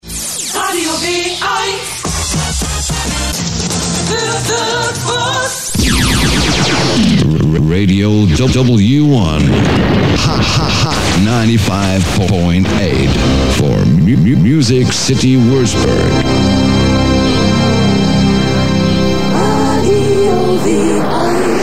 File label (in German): Hitradio Jinglemix